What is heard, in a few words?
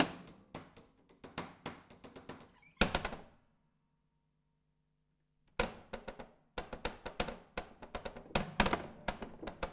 Panels Slap Dense, Piano Destruction